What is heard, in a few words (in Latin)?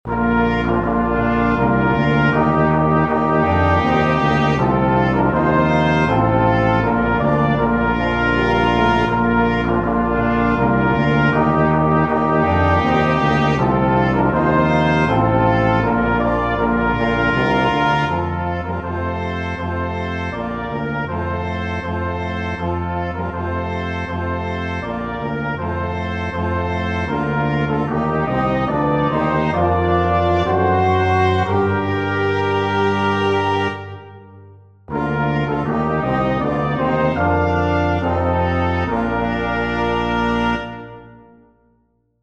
Gaudeamus igitur brass